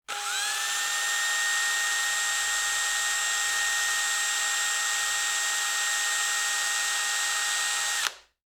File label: electric drill